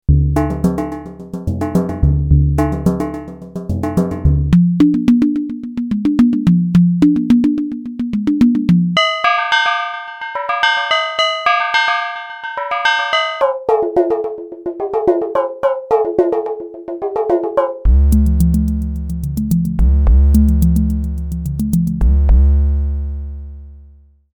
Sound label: Percussion